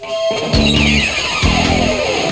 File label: slide